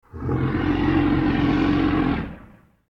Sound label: gruttural roar